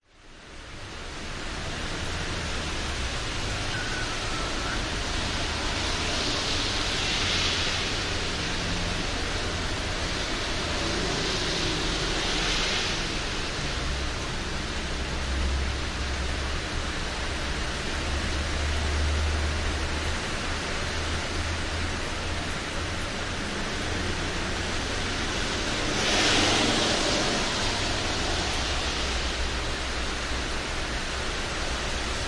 public places industrial estate rain wet roads traffic